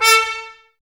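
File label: Brass trumpets A, Mute; Miroslav Vitous; Woodwind Brass Ensemble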